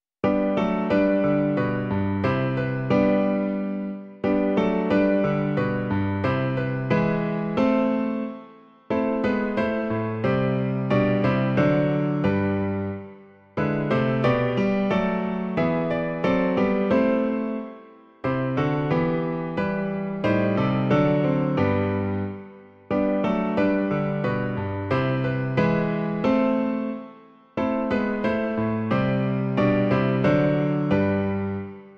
klavír; Sotva země v jitra zdobě; Klavírní verze

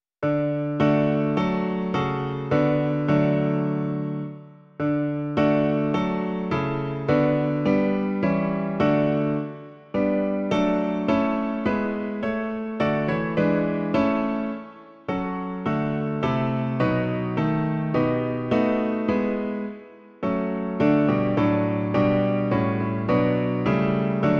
klavír; Živ dlouho ten; Klavírní verze

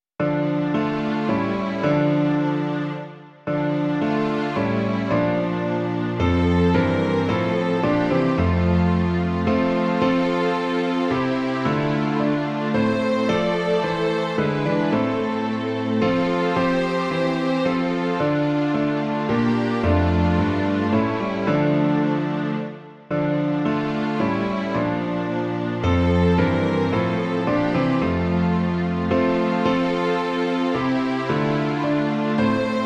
klavír se smyčcovým orchestrem; Tobě, ó Pane Ježíši; Imaginativní verze

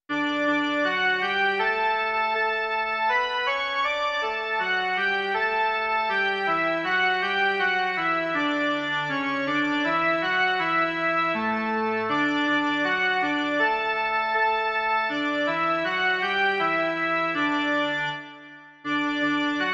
jen melodie; Otče všemohoucí

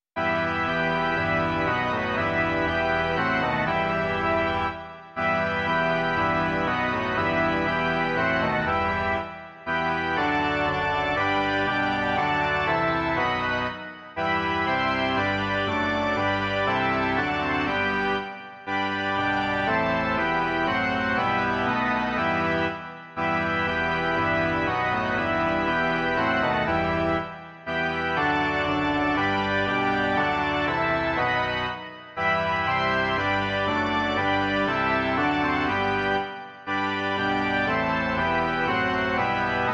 varhany

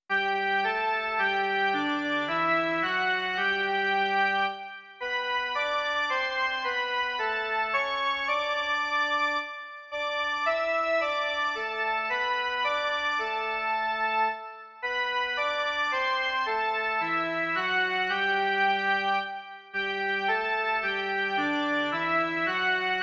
jen melodie; Otče náš všemohoucí

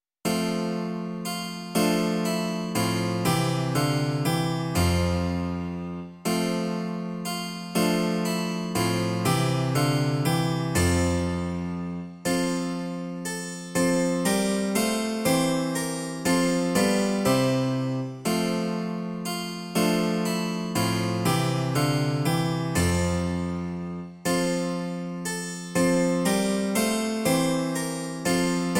cembalo a klavír; Bože, tobě dík a čest; Imaginativní verze

